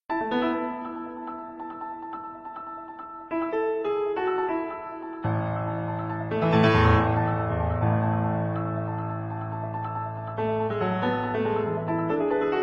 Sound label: Piano, ringtone; LG